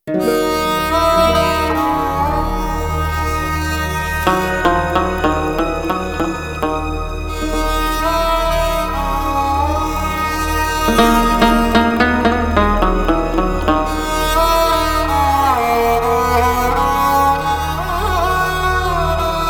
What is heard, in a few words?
Father's Theme (Instrumental); Veer-Zaara